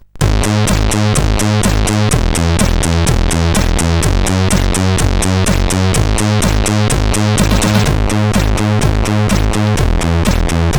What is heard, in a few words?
Disco Beat, CSG8580R5